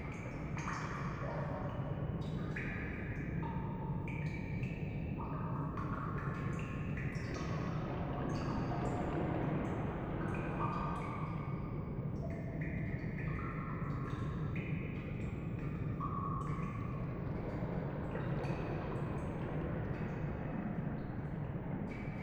Waterways Atmos Loop; gamerip

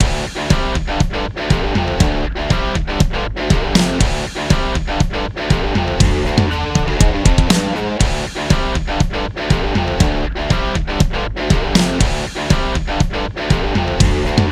Old School Rock Riffs

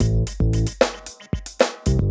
Music Bonus Round

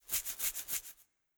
Ara Parrot Scratch

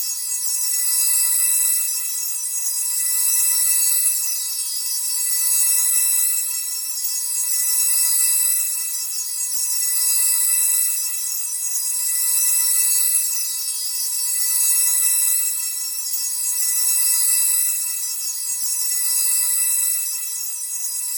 Card Pack; Rabbids Multiverse

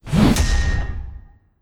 Boar Strike Attack; Legend of Keepers

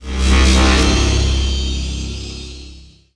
Relic Suit Stinger

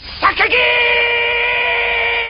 Japanese Shout; Mars Sortie

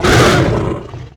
Tree Monster Hurt Roar; Mars Sortie

